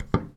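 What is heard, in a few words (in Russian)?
кнопка цифровой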